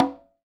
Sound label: CONGA; Sonic Reality; Interactive Drum Kits